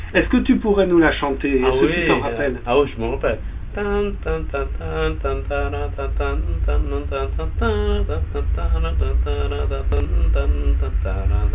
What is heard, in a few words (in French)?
ptis chante